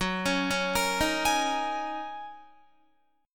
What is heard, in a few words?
Gb 6th Add 9th Gb down Guitar; Listen to Gb6add9 arpeggiated